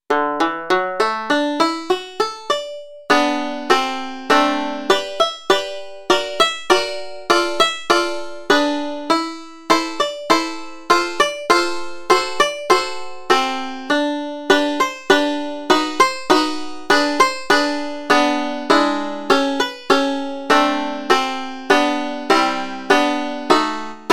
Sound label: Lazy Rhythm